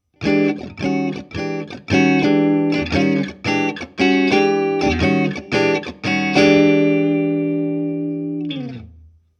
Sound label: Expressive Blues Guitar